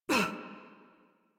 Pain Adult; Warframe; New War; Adult Operator

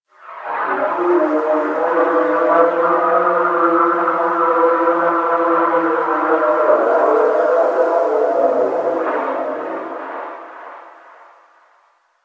Forest Moan Wind; Warframe; Grineer Forest

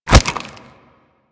Infested Bone Blade Scatter Shot Proj Impact A; Warframe

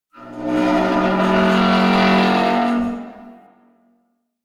exterior tractor metal groan; Ambience; Props; Warframe; Duviri

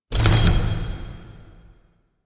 UI Mod Activate Turret Weapon; Player Ship; Warframe; Railjack

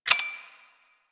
Glass Shotgun Fire Stop; Warframe; Tenno; Weapons

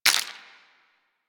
Inf Sniper Rifle Mech, Infested; Warframe

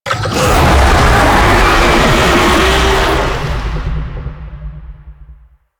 Infested Oni Vocal Spawn; Warframe

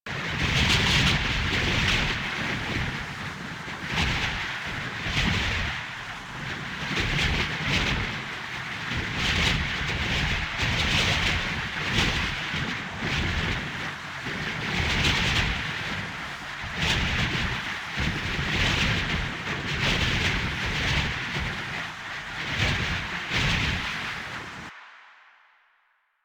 Wind Loop, Motorcycle; Motorcycle Ninety Nine

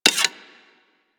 Daikyu Mod Arrow Pickup; Tenno

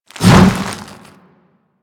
Leap; Warframe; Infested; Charger; Attacks

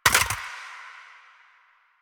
Pistol Reload Mag Drop; Weapons; DE; Warframe; Tenno; Lasrian

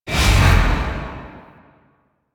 Void Dragon Baby Mine Boost; Enemies; Warframe; Duviri

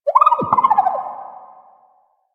Water Creature, Drifter Camp Valley ambience; One Offs; Warframe; Quests; The New War

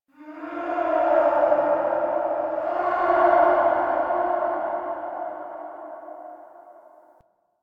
interior texture aggression calls; Ambience; Misc Indoor; DE; Warframe; Infested Eris

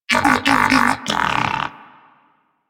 Moa Pet disagree; Warframe; Pets; Tenacious

